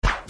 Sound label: Use Arrow